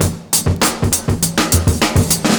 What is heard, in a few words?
70s Breakbeats; USB Soundscan vol.46; AKAI